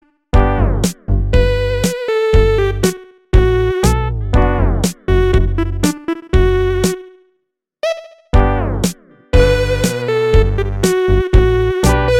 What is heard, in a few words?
8bit